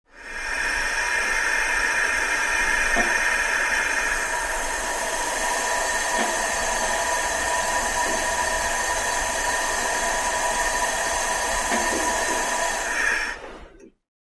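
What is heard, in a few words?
Water Flowing Through, pipes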